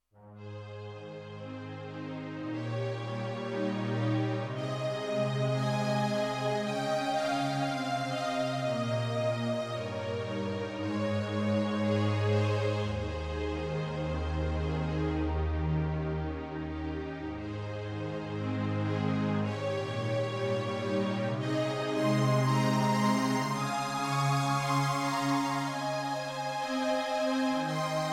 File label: Strings